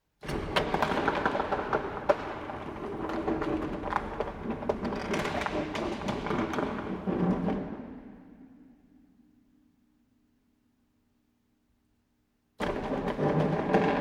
Industrial Mechanical Electric Door Large Mid Perspective Opening Vairations; Metal Door